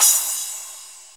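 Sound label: cymbal, dance drums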